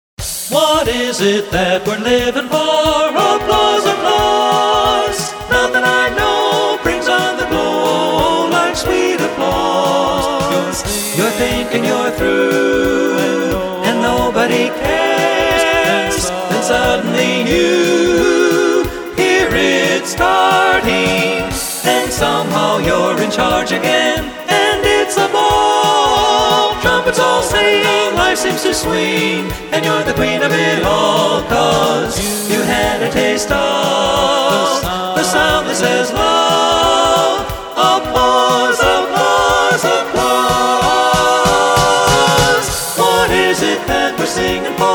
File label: Applause Applause; women